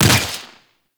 Projectile Explosion; Enemies; Abilities; Warframe; Sentient; Brachiolyst

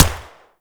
Viper Fire, Weapons; Warframe; Vipers

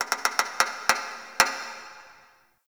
Metal Creak Small, Ambience

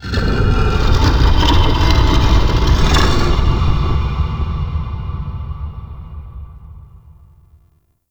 Twisted Stone; Warframe; Man In The Wall

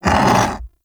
Undazoa Vocal Death; Warframe; Hunting Critters; Characters

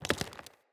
Mockery Foley Movement, enemy; Mockeries; Characters

